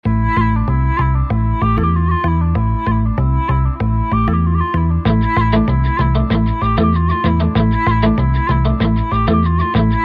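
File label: background music, Telugu Ringtone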